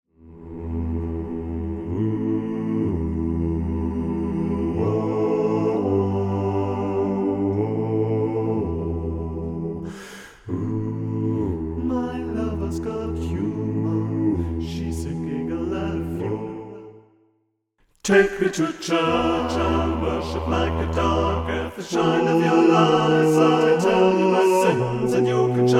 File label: BASS 2